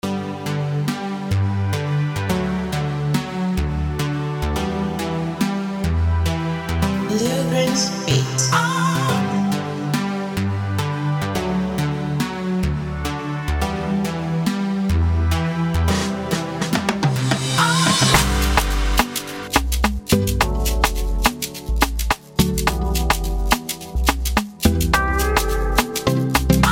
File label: Beat Instrumental